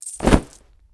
hanging clothe; CosmicRage; house

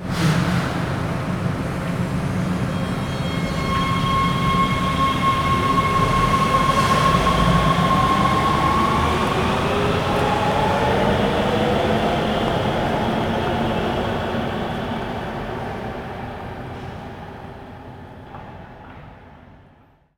leave, trains

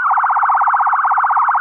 siren, emergency vehicle

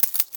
wrist, wear

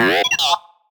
sad, Baby; CosmicRage

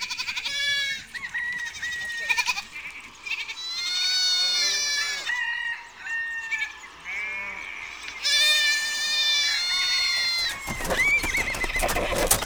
farm; ambiances

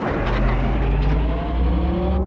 lift start; house

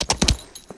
canter, horses; CosmicRage

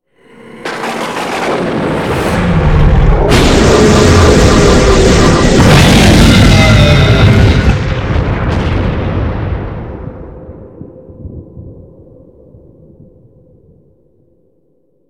evil; hosts

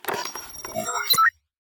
calibrate good; ships; salvaging

